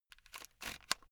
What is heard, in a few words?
headphone extend unsteady slow